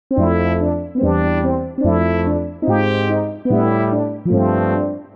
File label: Analog